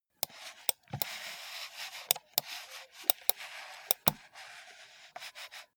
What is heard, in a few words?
computer mouse usage sequence short